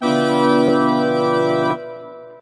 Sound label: big reveal organ, Synths; Notes